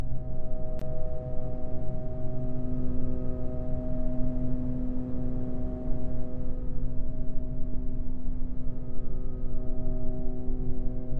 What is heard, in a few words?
Hotel Ambience